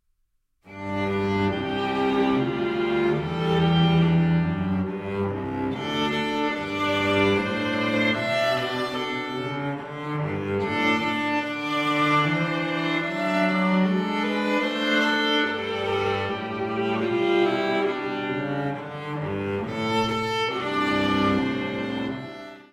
Soldiers' Song, Duos

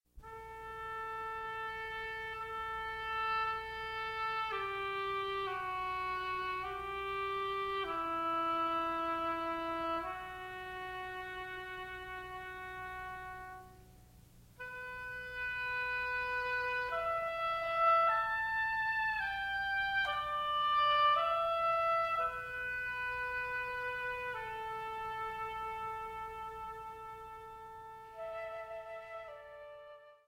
Duo for Flute & Oboe, Pastorale

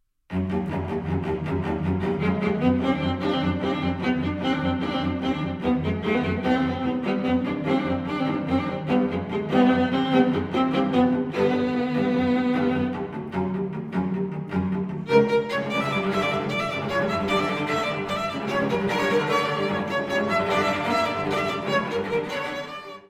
Duos Arabian Song

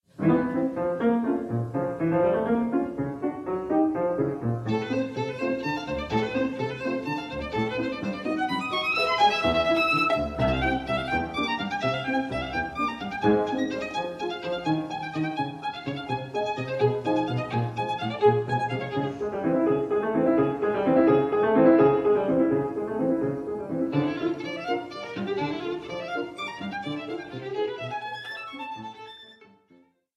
Sonata in D major

